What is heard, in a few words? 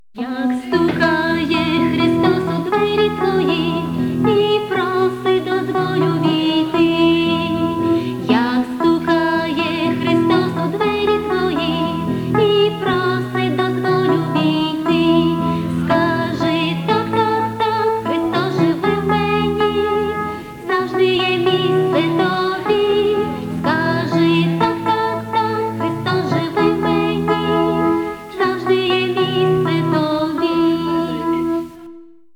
female solo